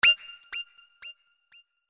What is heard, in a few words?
Wink Calendar, Synth; HTC